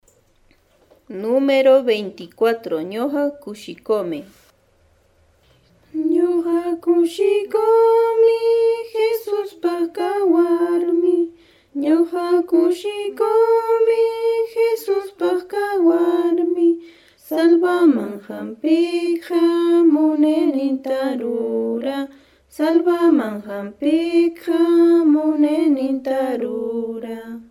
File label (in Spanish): cancion